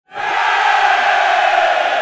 Soccer Cheer

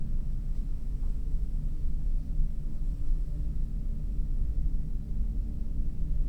elevator loop top

